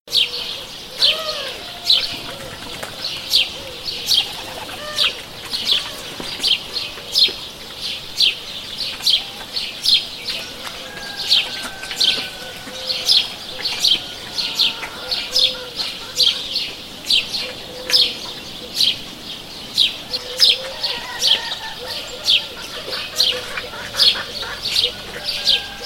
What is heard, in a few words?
morning village ambient